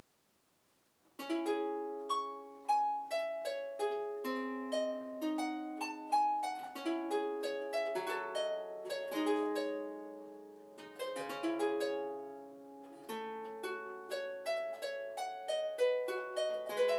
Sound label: harpsichord